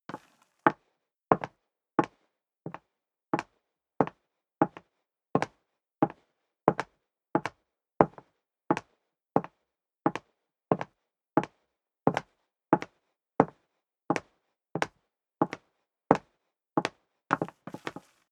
step leather shoes wood parquet walk sequence

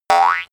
boing classic long

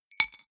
key ring small drop stone soft

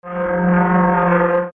metal scrapes

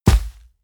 sand bag drop

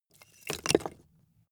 wood logs small fall

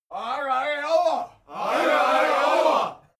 native american shout

